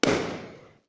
bang; bang sfx

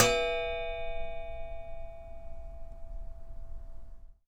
Flick, Japanese Pull Saw

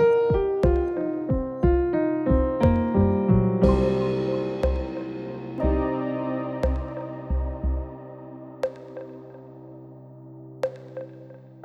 Chill Piano; Solos

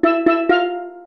jingles STEEL; music jingles; kenney

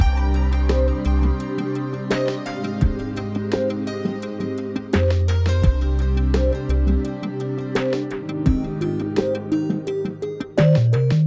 Between Snowfall and Spring - Vibes, Pads; BB 2HTC Samples Vol 4

